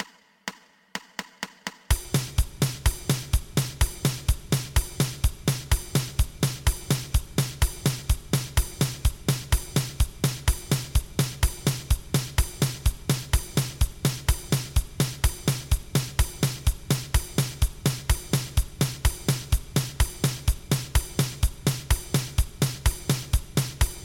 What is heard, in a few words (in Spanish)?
2 pulsos